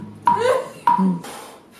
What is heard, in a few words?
laugh